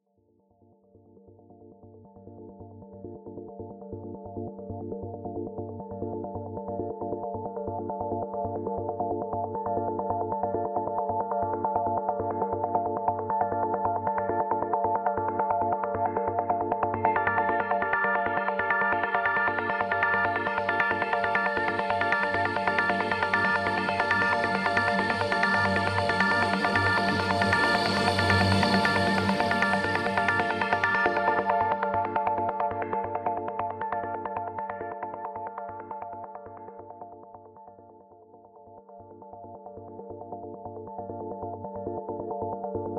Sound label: City Ruins Stem; Epitasis